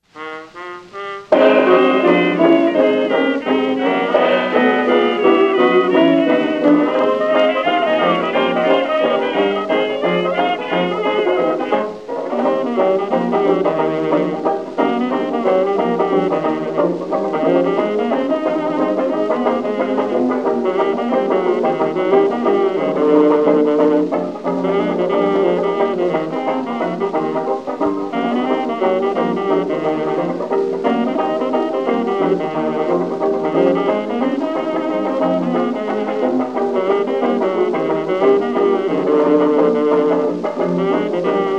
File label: Blues